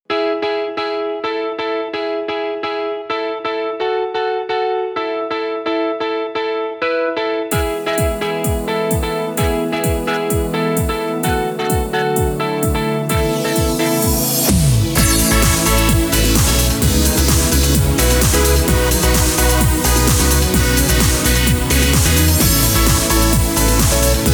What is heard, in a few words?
house